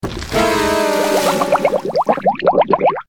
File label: Drowning Voice